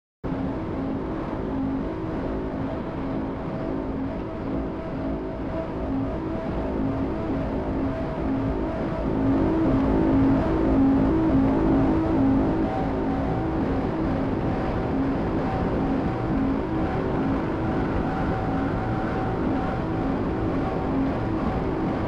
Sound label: ambient noise tones